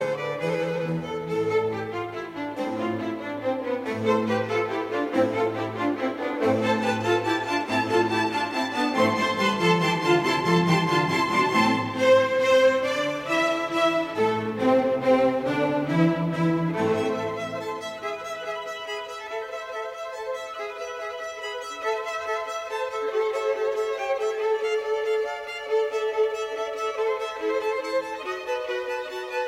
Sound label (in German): concerto a moll op 3 nr 8 fuer zwei violinen und orchester allegro